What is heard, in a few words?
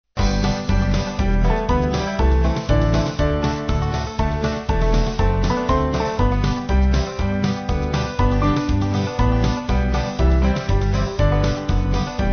One More Step, Band